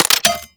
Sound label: Laser Aim Rifle Mech; Warframe; Weapons; Grineer; Grn Laser Aim Rifle